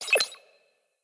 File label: UI Scribble Mid; blue archive